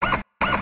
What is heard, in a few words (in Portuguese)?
robo ataque